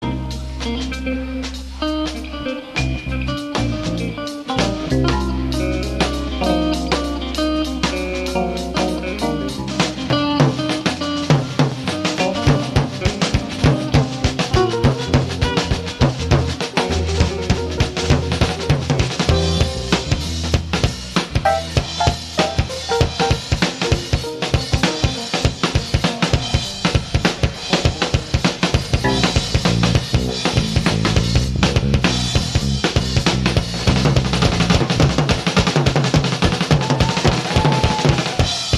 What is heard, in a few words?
Drums